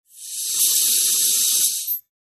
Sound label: Oxygen Tank Hiss